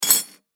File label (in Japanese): 刀の鍔